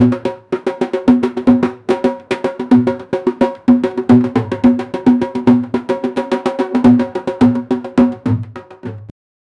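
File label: traditional yoruba drumming; world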